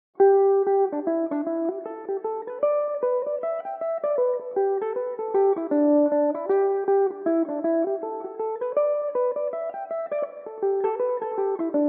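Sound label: G major, Irish mandolin; The Banshee; Belmuse EM-190 electric mandolin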